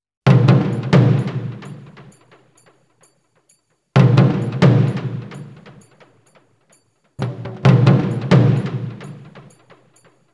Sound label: Drums In Friction